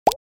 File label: Bright positive click